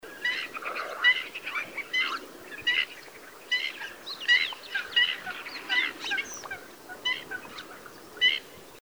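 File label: black-winged stilt himantopus; komsomolskoe aue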